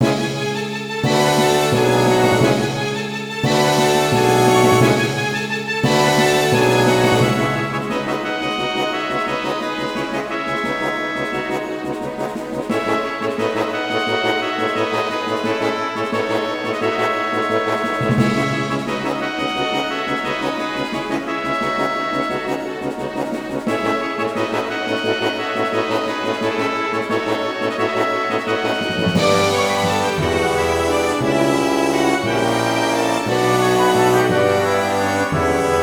Negative

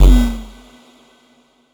Fx Impact Skip; bass house

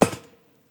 Step Metal Plate

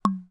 Drink Loop

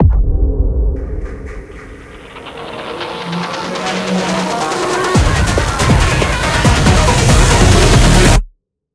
Boss 3 Intro; Voidbreaker; ps5 windows xbox series xs

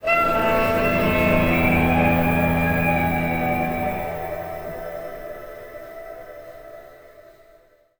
Bugle Cursed

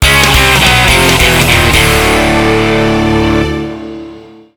Jingle ~ You Lost; Bakugan Battle Brawlers; NDS

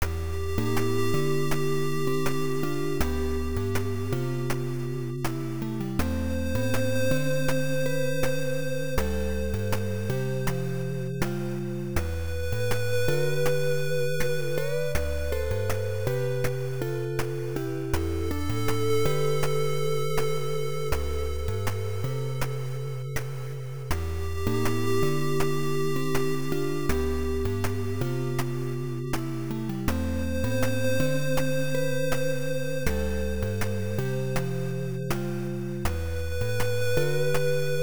Evening Calm; Power Slave; PC-9801

